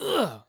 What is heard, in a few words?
scream